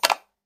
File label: keyboard